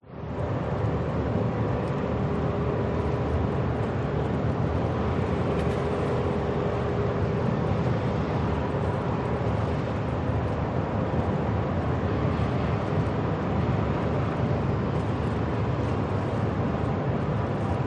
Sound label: Harbour Ambience; audio hero